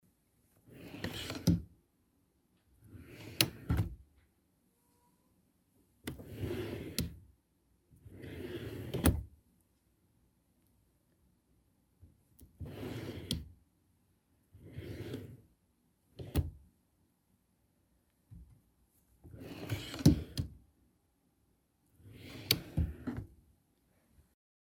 v garberobnoj na polozkah

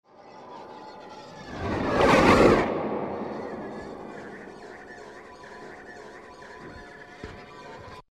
prizrak pronessja rjadom i ostavil sled v vozduhe

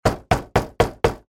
nastojchivo

